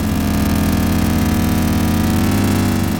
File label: car engine; Sports & Arcade; freepixel